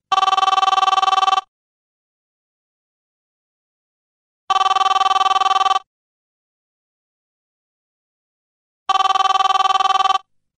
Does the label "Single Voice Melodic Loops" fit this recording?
No